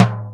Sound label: R8 Tom, Roland R-8